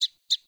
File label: Sparrow Double Chirp; Animals; Vocalizations